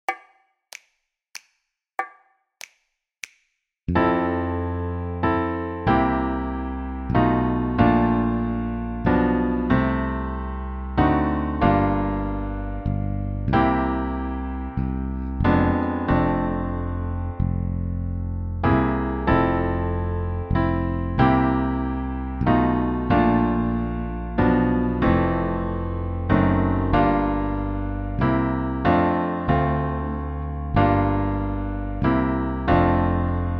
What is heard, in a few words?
Away in a Manger accomp